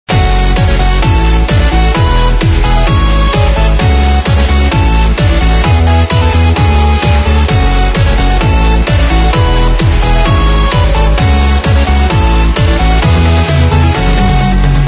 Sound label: menu music; geometryrash